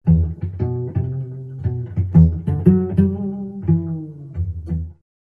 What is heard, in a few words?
Slow Pizzicato Blues